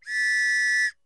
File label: police whistle